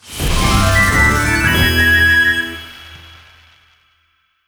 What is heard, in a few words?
large Win Musical; netent